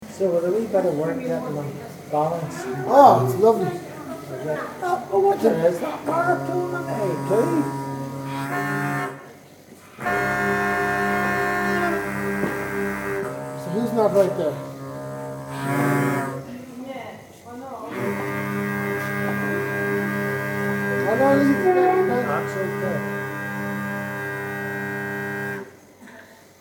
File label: Tuning drones; Maxwell drones; In the Big Room; 2007 Interview